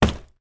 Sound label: foley handbag put down on floor